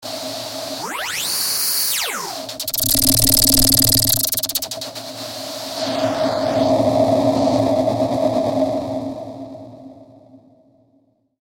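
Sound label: Control Steam, V-Synth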